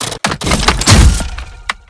deadlock deploy

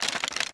inspect, weapons; vandal

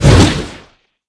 release wolf; fade

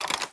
reload, weapons; operator

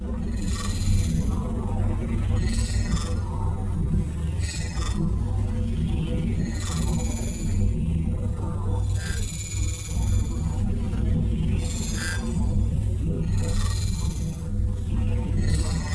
weapon idle; cstrike; Valorant

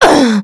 death; fade

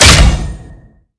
take head damage; cstrike; Valorant

